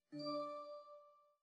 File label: windows live messenger online sound